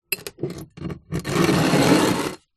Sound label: foley glass bottle movement on dirty table